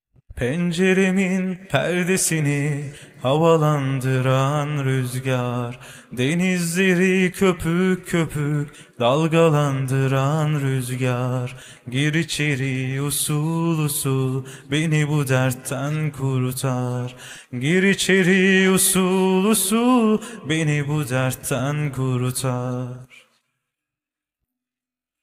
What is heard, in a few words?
vocals